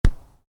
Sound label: Soccer Ball: Header The Ball; Football; Sports